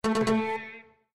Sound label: Garden Hardware Fail, windows 7